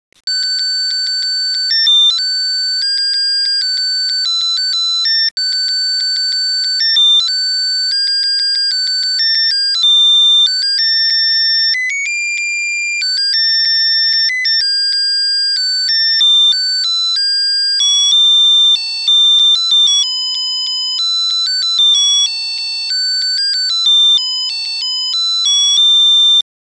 Jingle bell Santa clause We wish you xmas, Melody song